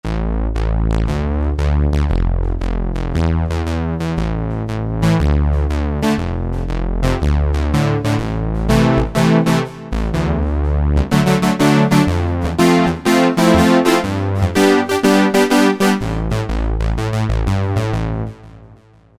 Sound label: Bass; Cheetah MS6